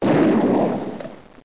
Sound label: shotgun; thanksgiving